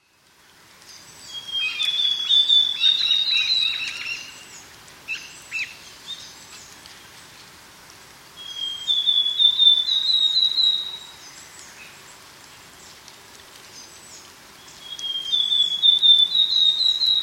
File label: kogara; コガラ; さえずり; 2004年5月7日 静岡県富士宮市